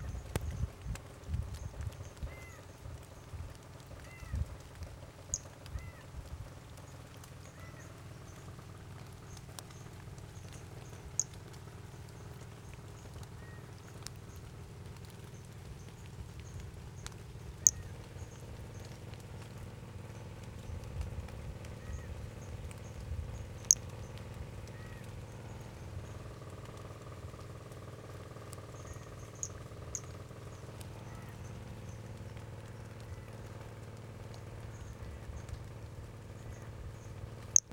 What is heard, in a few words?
Brachycephalus albolineatus individual; unvouchered